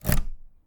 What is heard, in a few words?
insert key, 鍵を抜くor差す; insert key1